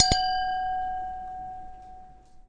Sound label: glass glass, Crystal Glass Strikes; UNUSUAL KITS and FX